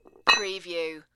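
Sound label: Weight Drop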